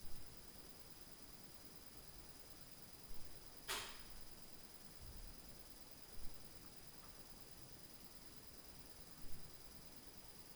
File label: TAK, Night Noise, Beast Noise